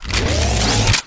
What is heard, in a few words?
gauss reload; gusanos promode